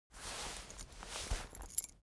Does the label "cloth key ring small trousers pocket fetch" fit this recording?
Yes